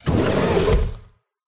Jumpscare; SFX; PJ Fluffy CaterPiller

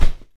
sweep

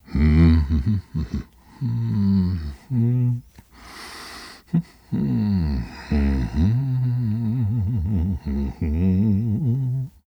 Voices male humming

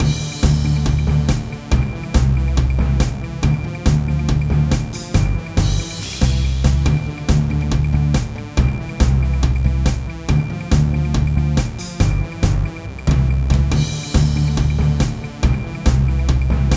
Match Battle; Football Academy 2009

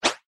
Interface Swipe; Infernal Radiation

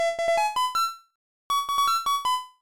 Jingle NEW LIFE; Frogger Helmet Chaos; nintendo ds

